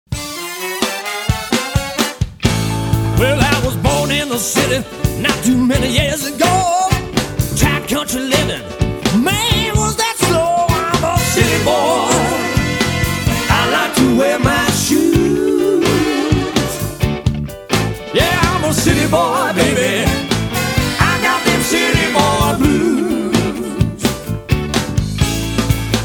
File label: Blues